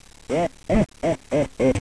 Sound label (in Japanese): waraigoe